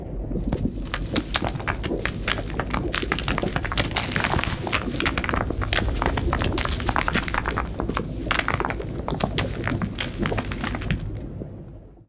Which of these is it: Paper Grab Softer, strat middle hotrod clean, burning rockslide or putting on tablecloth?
burning rockslide